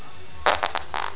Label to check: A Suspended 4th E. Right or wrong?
Wrong